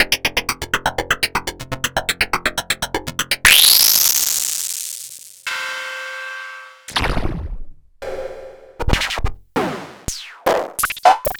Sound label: Hat c